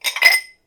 piling dishes; cooking

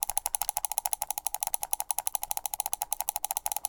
clicks

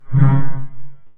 light saber

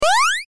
powerup

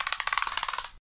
lengthen measure

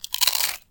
ice cream cone; eating

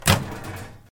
microwave; cooking

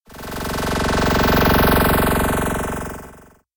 hiding, magic

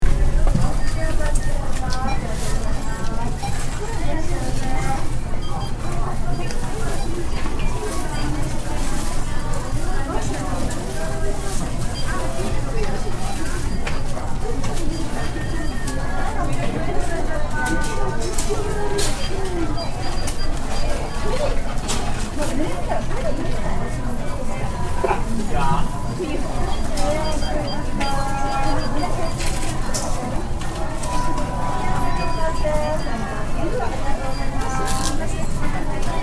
supermarket

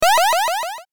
powerup

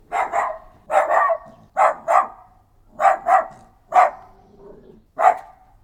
small dog; animals

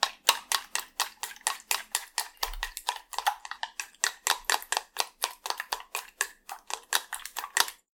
mix egg; cooking

